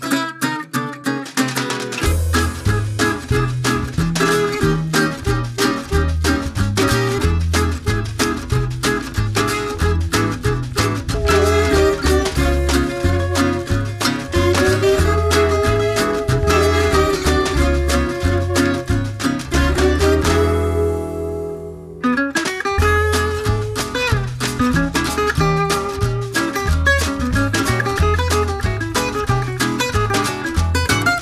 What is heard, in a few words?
Travel Swing